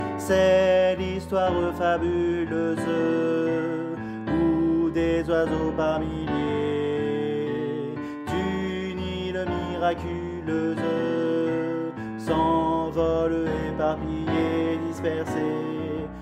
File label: Alto